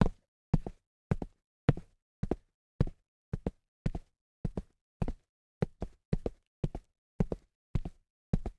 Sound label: Footsteps Cement; HauntedBloodlines; SFX Producer